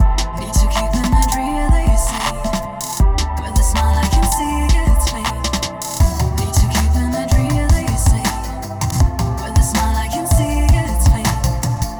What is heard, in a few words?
Absolute 80s, free loops